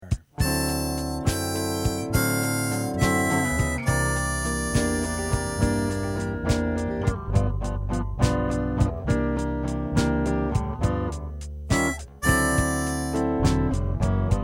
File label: 12-Bar Blues With 4-Bar; Alfred Publishing